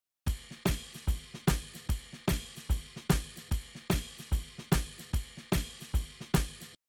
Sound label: Drums, shuffle drums; WDR2